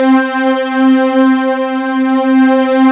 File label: PAD LOW, Synth Pads; SYN-PADs; Synth-Pads 4